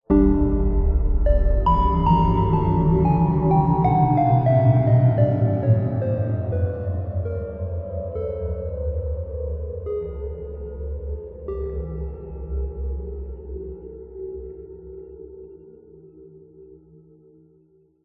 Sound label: horror ghostly ominous haunted musical riff chime scary